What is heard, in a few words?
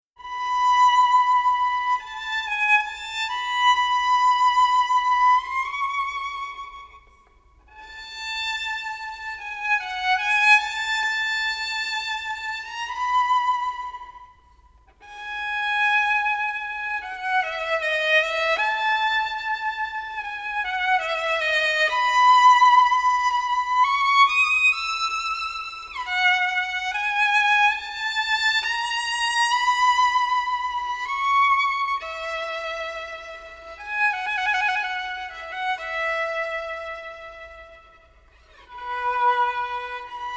Adagio in E Major; K. 261; Genius 2017